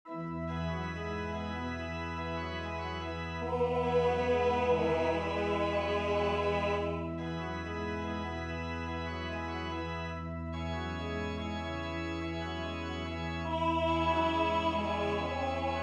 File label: Messe Solennelle Sanctus tutti; Vierne